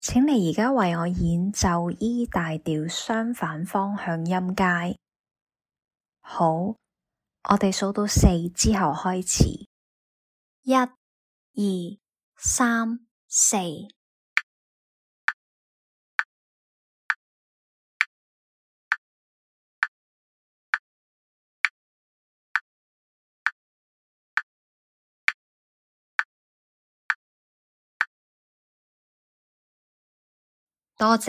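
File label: e major contrary motion scale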